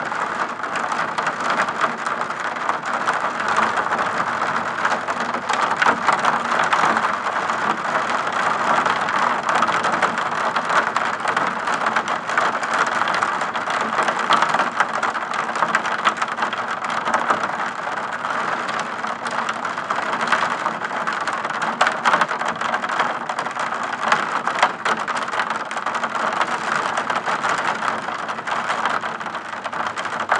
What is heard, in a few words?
Natural Sounds Lightning, Glass Rains